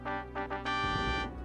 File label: ding; quest for glory